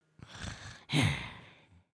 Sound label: Sleep, creatures organic; Lego; Strombie